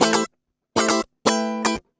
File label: 120 BPM